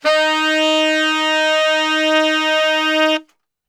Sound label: D#2 SAX STR, Sax